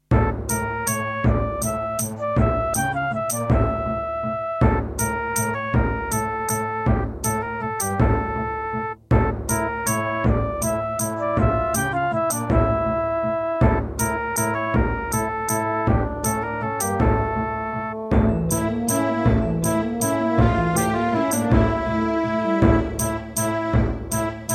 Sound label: Terratec SCW001-2